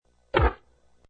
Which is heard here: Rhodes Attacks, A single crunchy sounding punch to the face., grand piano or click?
A single crunchy sounding punch to the face.